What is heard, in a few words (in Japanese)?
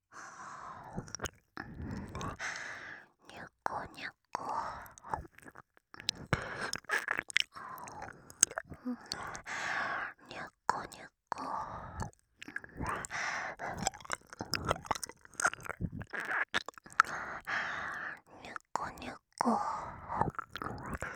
耳舐め にゅっこにゅっこ